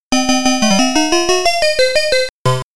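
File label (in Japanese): round clear music; Super Pac-Man; arcade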